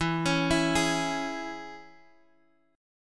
E 6th, down, Guitar, DADGAD

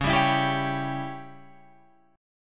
C sharp Diminished C sharp down Guitar Open F; C#dim strummed; JGuitar

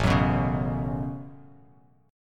A 6th Add 9th A down Bass Standard; A6add9; strummed

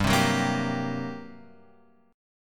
G Minor Major 7th Double Flat 5th G; GmM7bb5 Chord {3 3 4 3 X 3} strummed

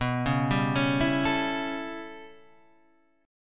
A 7th B, chord; A7/B; arpeggiated; JGuitar

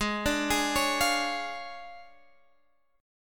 D Minor Major 7th Flat 5th, G sharp

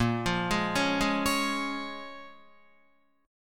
Bb Minor 7th, Guitar, Bbm7 chord; Bbm7 arpeggiated

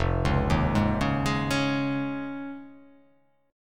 F Minor 7th Sharp 5th

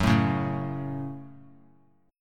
Gb Minor Gb; strummed; JGuitar

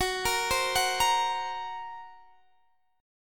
Gb Major Flat 5th, Guitar Standard; arpeggiated; JGuitar

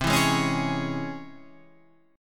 Db Minor Major 7th C

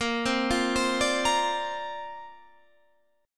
D-Minor 7th Sharp 5th A sharp, chord

